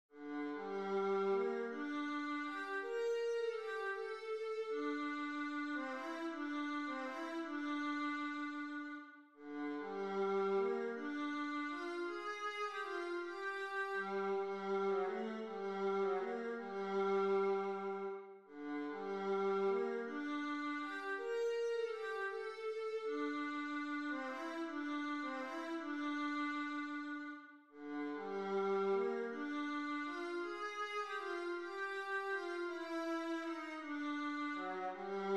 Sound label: sicilienne viola